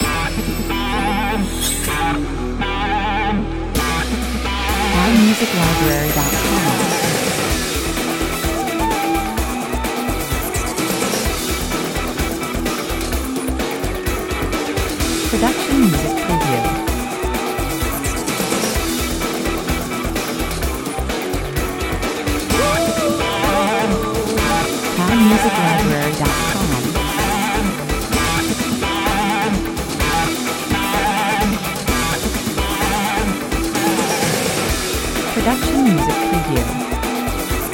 powerful intro (no melody) 128 bpm, A driving, energetic theme perfect for any modern show opening